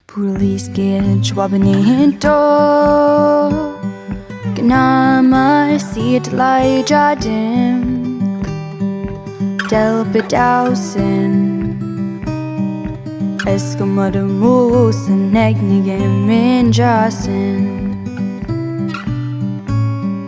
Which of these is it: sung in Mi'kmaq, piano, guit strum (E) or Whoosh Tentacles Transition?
sung in Mi'kmaq